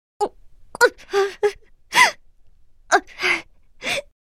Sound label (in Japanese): （泣き声）; エモーション（悲しむ）